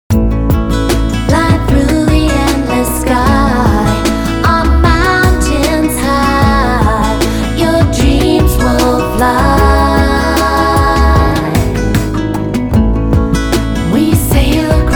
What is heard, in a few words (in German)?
gesang; malta